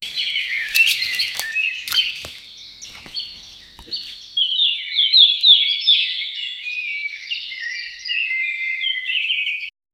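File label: birds chirping in a forest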